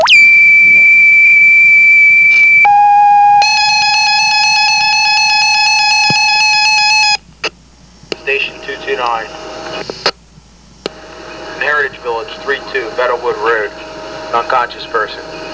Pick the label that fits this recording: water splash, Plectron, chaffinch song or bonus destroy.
Plectron